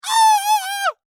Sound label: Horror Scream Male; Screaming; Voice